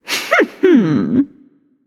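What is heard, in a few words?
nonverbal heh heh; smite; izanami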